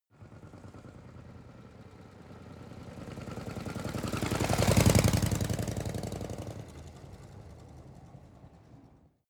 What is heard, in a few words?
motorcycle harley drive by slow